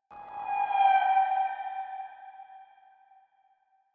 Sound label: Going Down; TRUTH-HZ; Crowdsourced Sample Pack